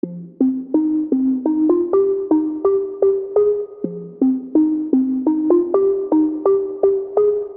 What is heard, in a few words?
Synth Loop 126bpm Fm; Unison Artist Series; Tchami "Eternal Sounds" Vol.1